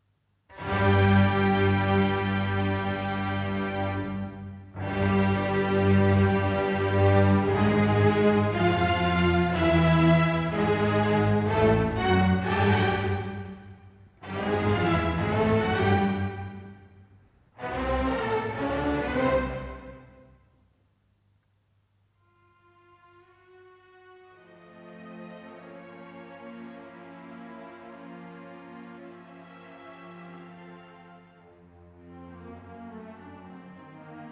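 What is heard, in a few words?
Fugue for string quartet in B flat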